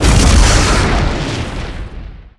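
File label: Explosion Rocket Impact; Destruction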